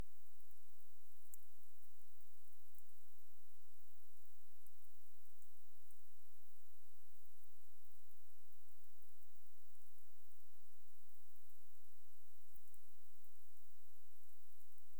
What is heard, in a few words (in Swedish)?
Nordfladdermus med fangstsurr